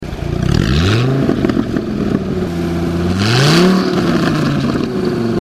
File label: viper exterior revs, exhaust systems; corsa